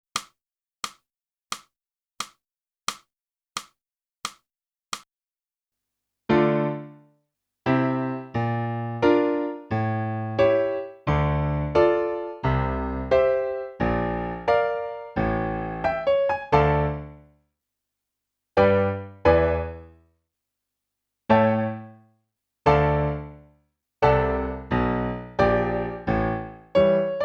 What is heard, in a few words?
flute, blue tones